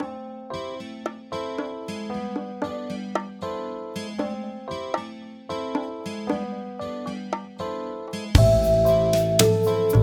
Lift Music; Soul Axiom

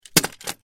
button fall; Monster Arena

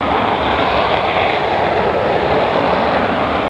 Jet Crackling; Learn to Fly 3

